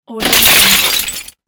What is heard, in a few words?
garbage; 3dhome